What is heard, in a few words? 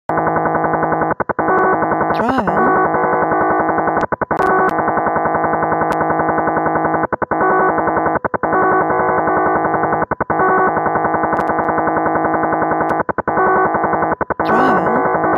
utility japanese navy 4154 kHz